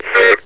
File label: wound